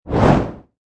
Torch Movement Fireball Pass By Fast, Torch flame or fireball moving fast through air, creatin